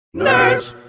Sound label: nerds sing